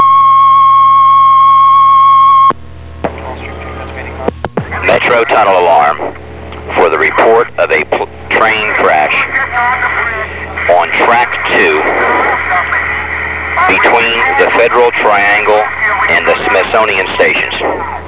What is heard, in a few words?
metro crash